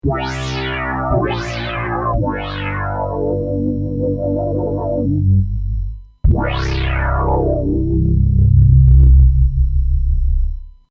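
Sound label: sweeper, synth; jx8p; metropolis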